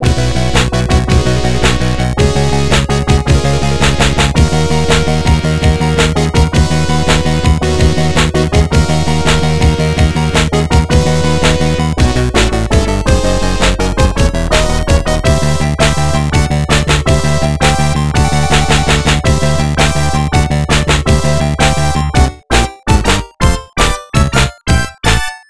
victory theme; prime time nfl nfl 98 genesis

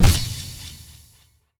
boss stun

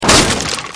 Wood Hit Large; Monster Truck Destruction; Android

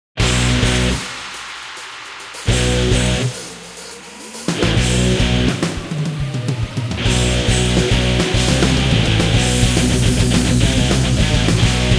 bgm bpm210; Naruto Ultimate Storm; android; StudioDOMA kihan

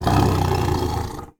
growl

